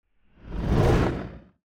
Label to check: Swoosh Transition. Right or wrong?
Right